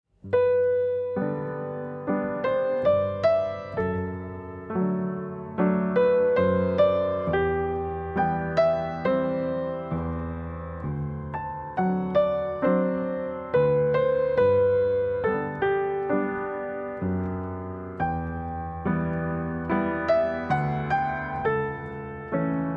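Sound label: Arioso in G major, piano; Bach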